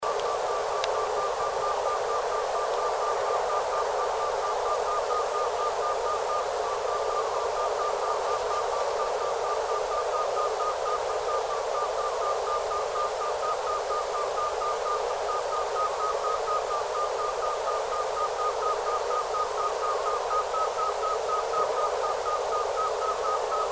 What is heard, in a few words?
fast song